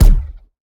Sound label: Ball Player Hand Hit Extra Hard